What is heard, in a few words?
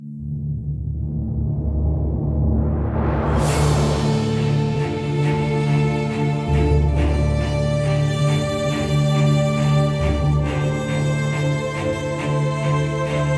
combat start; Armello The Bandit Clan